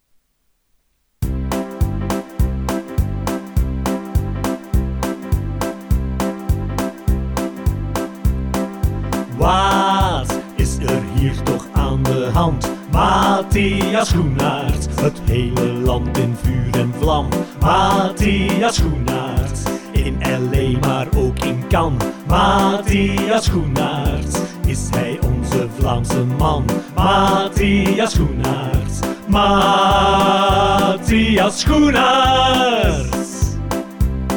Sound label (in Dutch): Orgel; Matthias Schoenaerts